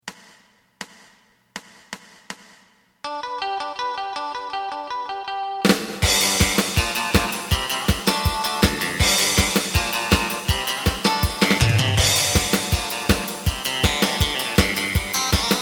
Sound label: bass